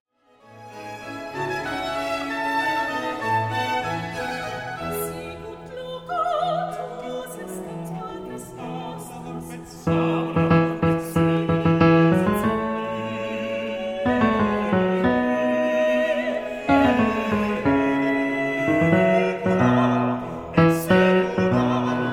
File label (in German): Magnificat Nr. 8 Bass